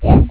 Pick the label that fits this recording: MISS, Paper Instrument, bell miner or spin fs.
MISS